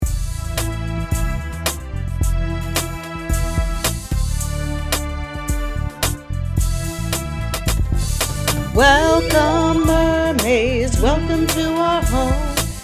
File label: vocals